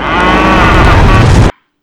axe charge windup; weapons; tf2

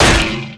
neon sign hit world; tf2

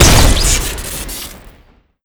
revolver shoot crit; tf2

